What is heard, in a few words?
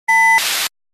glitch fx